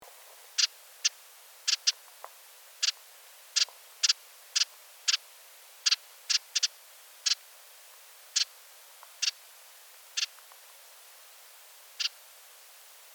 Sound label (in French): roitelet a couronne rubis, chant